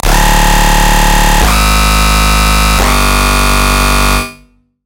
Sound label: Bass - Distorted Scream; Routed Sound; Vital Expansion - Chaos Bringer Vol.1